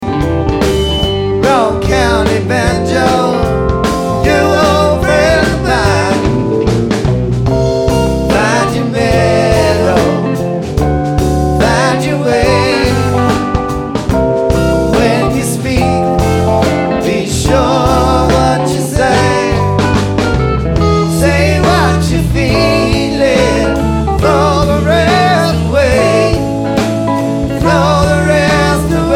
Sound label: Banjo